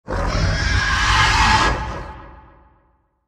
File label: Pig Squeal Eerie Big